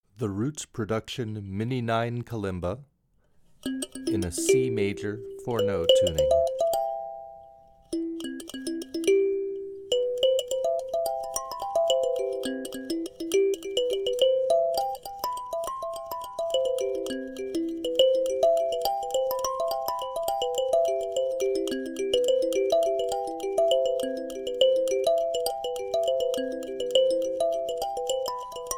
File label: Mini 9 C Maj